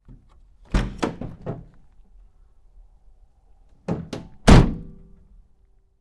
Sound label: Truck GMC Savana Door Rear Open Close Hard; 2010 GMC Savana; Trucks